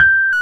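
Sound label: Piano G5 f 73, Rhodes 73 Suitcase; Keyboards of The 60's and 70's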